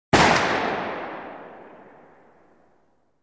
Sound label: gun